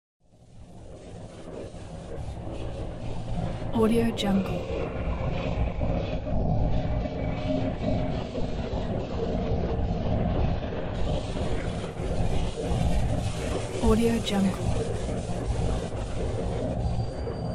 intergalactic cryptic deep environment

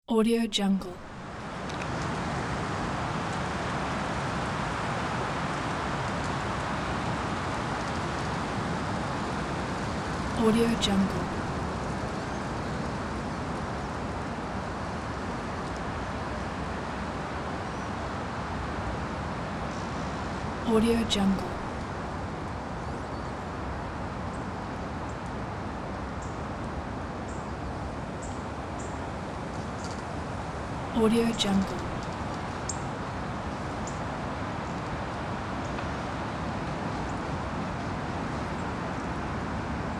forest high wind

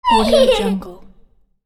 kid laughing

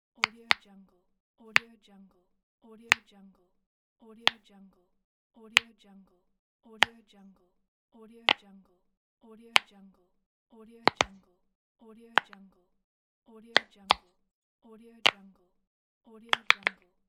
pop it

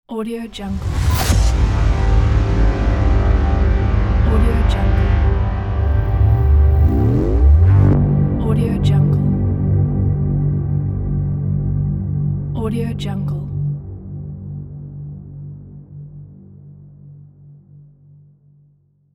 mysterious

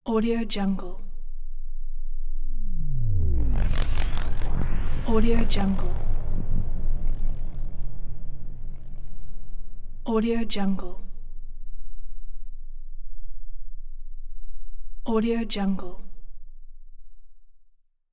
exiting hyperspace; awarenessence

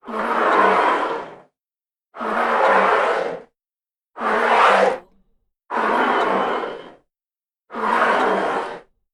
the glass slide

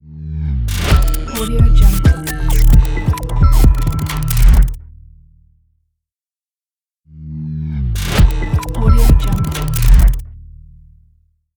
logo glitch

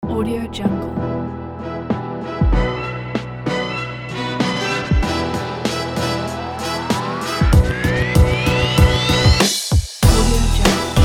hiphop

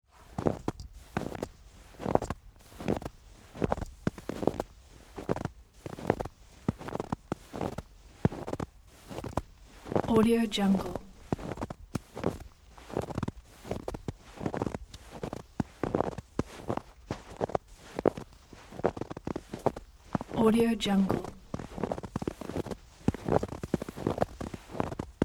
walking footsteps in snow

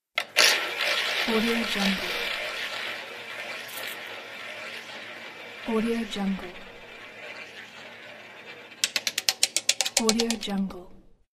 roulette wheel and ball; hasi007

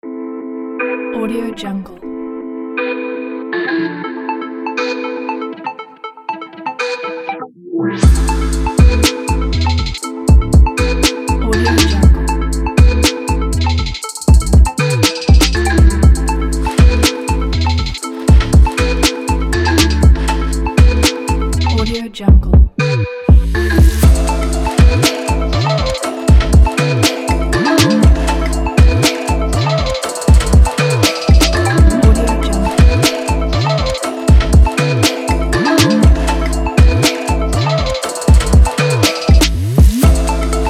energetic hiphop

